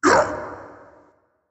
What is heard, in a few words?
grunt, firegiant; smite